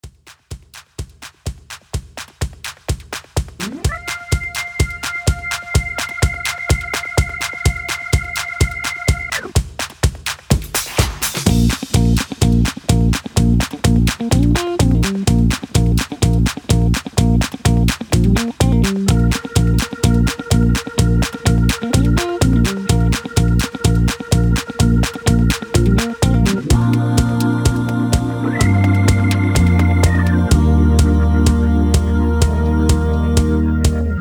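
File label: Instrumental No Melody with background vocals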